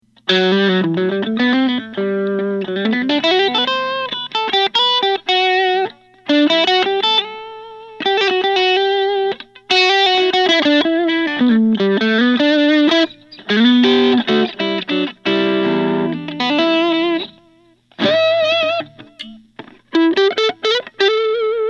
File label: gibson les paul custom blonde